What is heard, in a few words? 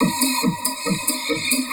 percussion loops, Techno; Techno ID